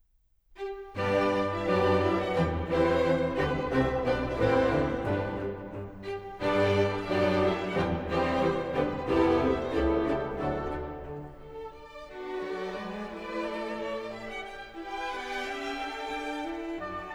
Pulcinella, ballet with song, orchestra, Ouverture; Allegro Mod